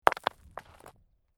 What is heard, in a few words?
Rock Fall And Tumble Onto Rocks; A small stone or rock falls on other rocks. Rock impact and settle.; Rock Sound Effects